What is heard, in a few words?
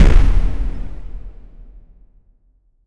video game shotgun blast; diffuse